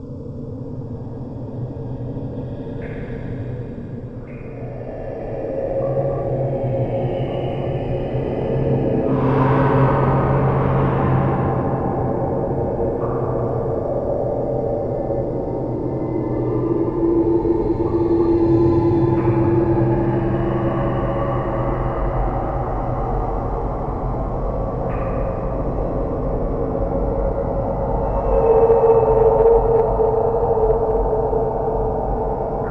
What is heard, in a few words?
cave ambience; Gauntlet Seven Sorrows